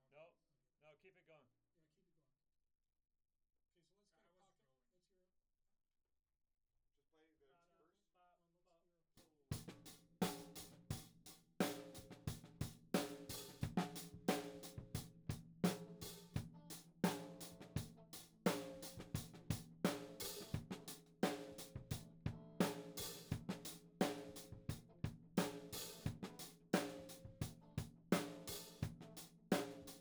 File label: Tom1